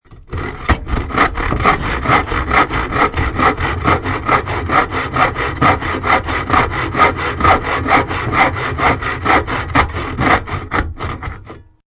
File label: Hacksaw, High quality stereo recording of hacksaw on wood.; Hand Tools